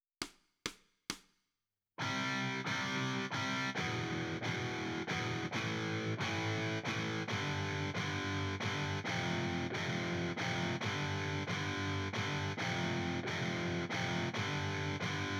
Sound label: Guitar Right